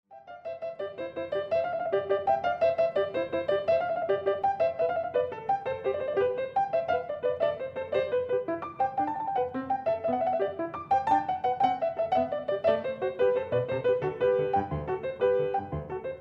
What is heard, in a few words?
Piano; Scarlatti